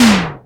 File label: Ensoniq ESQ-1 Hi Mid Synth Tom